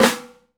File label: FLAM, 50S JAZZ; Northstar - New Gold VOL-1